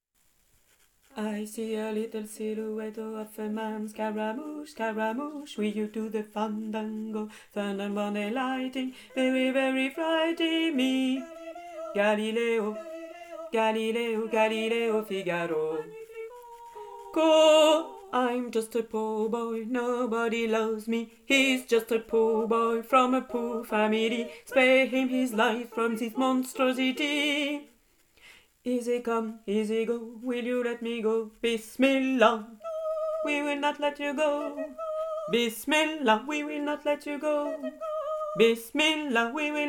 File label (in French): tenori